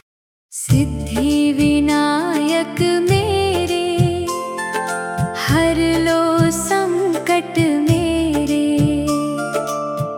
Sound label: Hindi , ringtone; ganesh